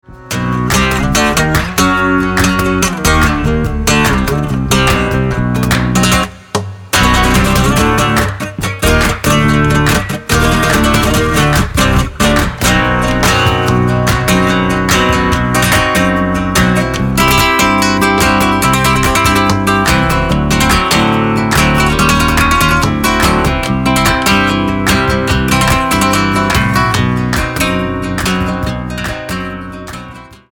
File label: Tangos